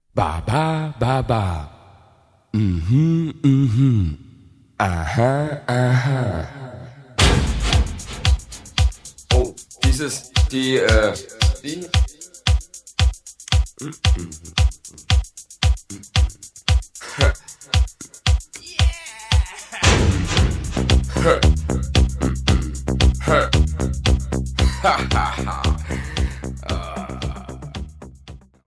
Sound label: electrica salsa